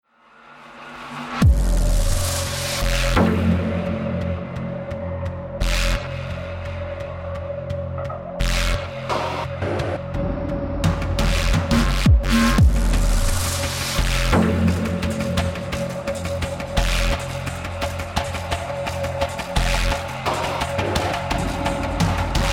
start round, music

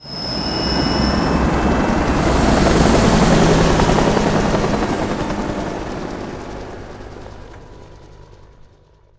helicopter; Counter Strike Model Pack; CS:GO; vehicles insertion

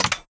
door default unlock; locks; Half Life Alyx

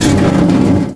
pontoon scrape rough, airboat; Half Life 2; vehicles

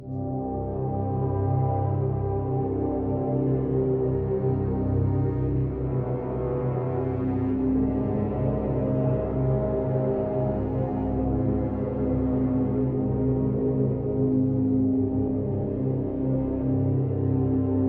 entrance opened gate, music

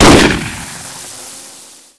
fire, flaregun; Half Life 2; weapons

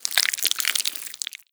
flap long, zombie blind jaws

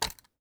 rotate weapon, ui; Counter Strike Model Pack; CS:GO; panorama

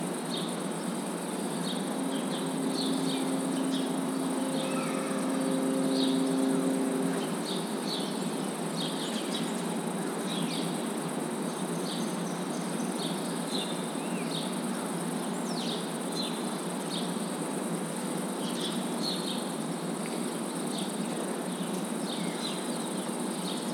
exterior; inferno

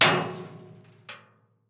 bullet metal barrel; physics

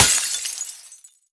break glass jar; physics; breaking

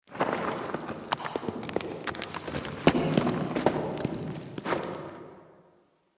cavern excavation rockslide; ambient, rocks

